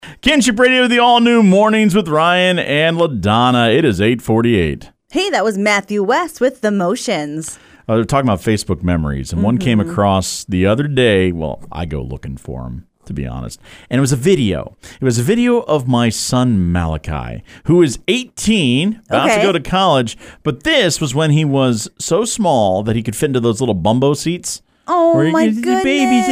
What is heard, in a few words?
Baby Laughing; Morning Show